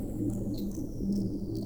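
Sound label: Music Dpad Evaporate 145; from dust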